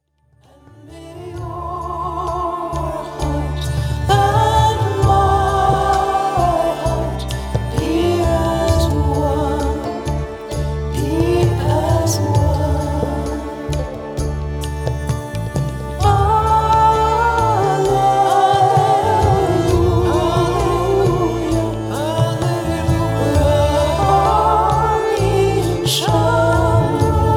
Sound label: Chakra Healing Chants